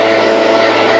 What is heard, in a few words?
leaf blower short